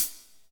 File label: HAT, Drumscapes Roland